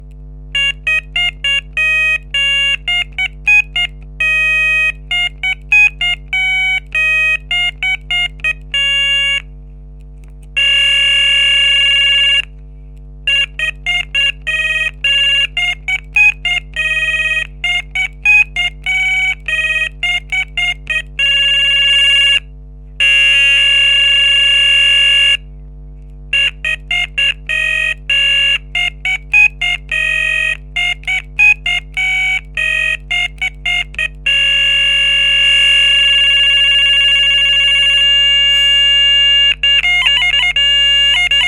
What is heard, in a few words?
synth